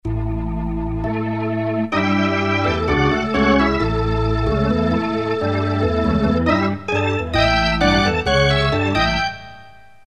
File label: Organ